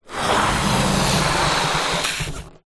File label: balloon inflate blow up